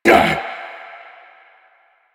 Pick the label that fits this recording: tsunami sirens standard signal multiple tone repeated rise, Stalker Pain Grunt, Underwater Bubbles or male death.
Stalker Pain Grunt